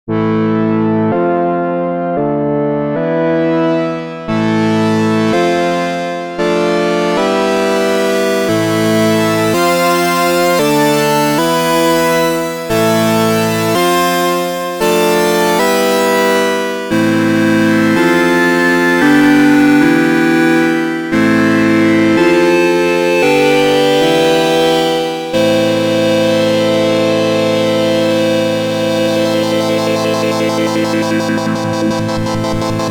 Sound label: Filthy Pad